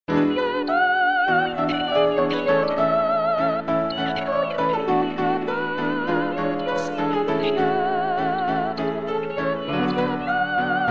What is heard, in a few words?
Soprano